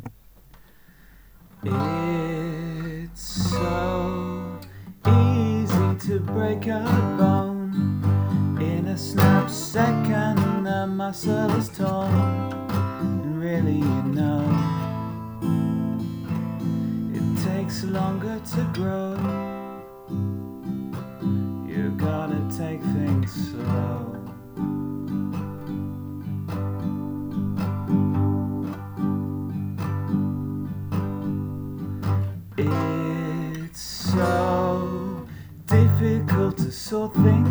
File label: slow song